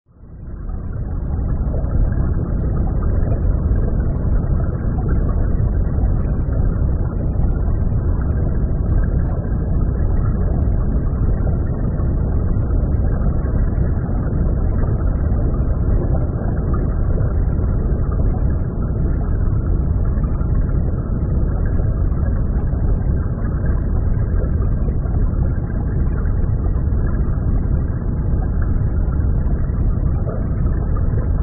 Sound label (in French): Bruit fond marin Ambiance sous marine relaxation